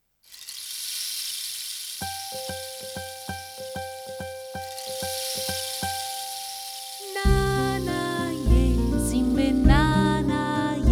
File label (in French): nanaye chant